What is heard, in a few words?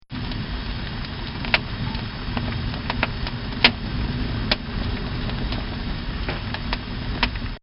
Fireplace Roaring